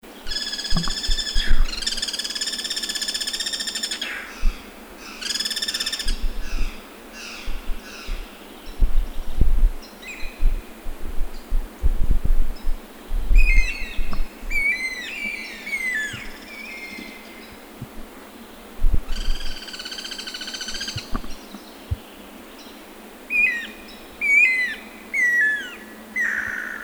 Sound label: Cuckoo